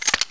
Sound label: p90 clip out; cstrike; diliulsound